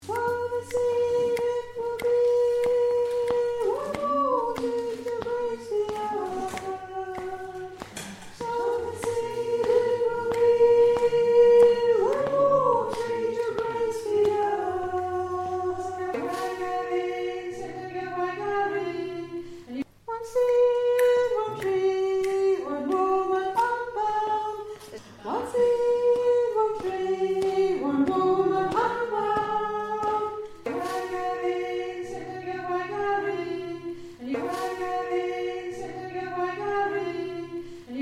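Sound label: soprano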